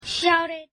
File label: shouted